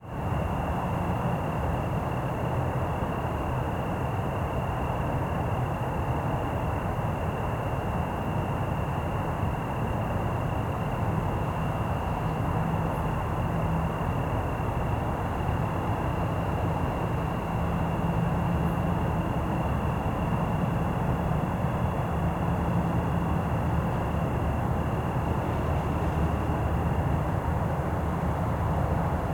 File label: City Night Evening Ambience, Cicada Single, Distant Traffic; 5.1 LCRLsRsLf; 2496SoundEffects; Surround in The Night 5.1- Stereo